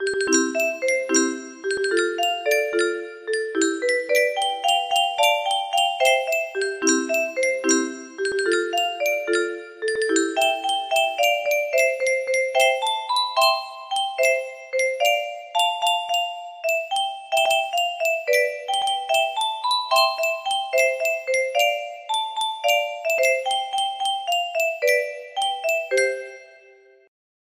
melody, music box melody

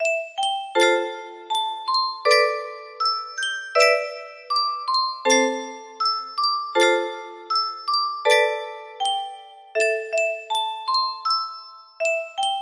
music box

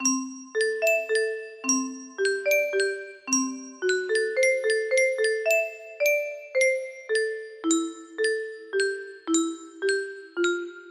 music box

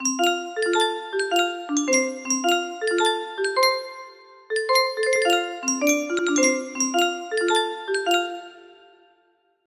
track 2 electric piano

